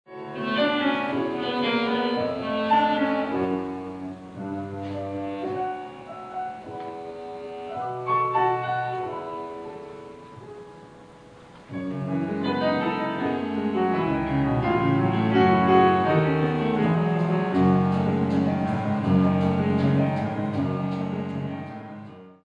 piano

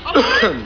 Cough

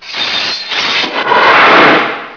draw sword

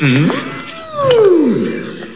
mmmm

